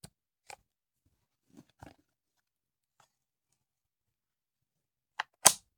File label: Machine Gun Foley; Sound Ideas; Guns